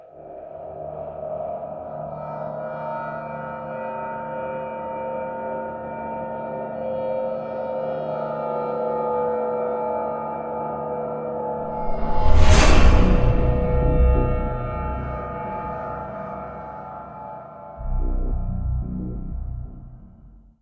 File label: Fin Stab